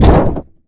hit human hood ricochet; Blind Drive

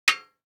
DICE on Metal, Drop, Standard, 1 One Die; Samples; ArticulatedSounds; DICE Sfx Party (Extended)

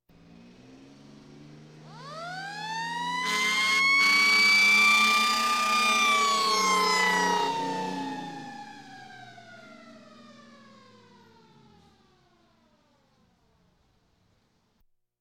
Police, Fire engines

